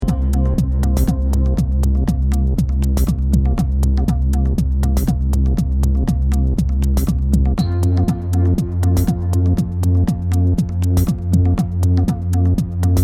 Comp and Presence unproc

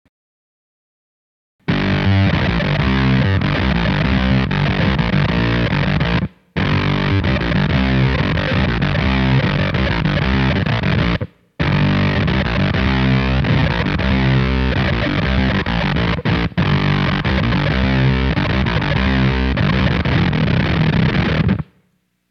Micro Syntesizer Tight Bottom

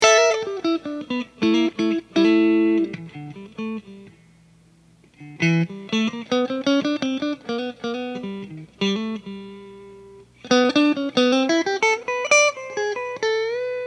Blues Clean Solo

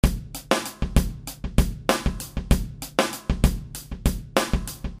Drums

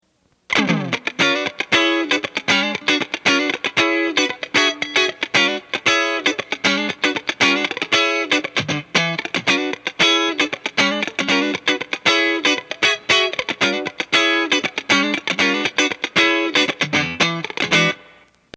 Blues Funk Groove

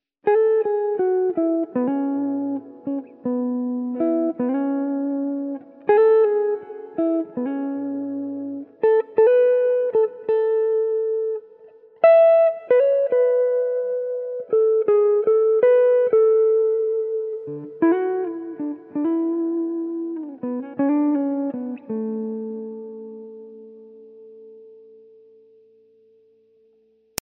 Mellow jazz

